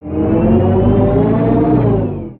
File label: Siege Tower; Distant; destructibles